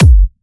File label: Hard Kick; Dj Shashi Hard Kick Pack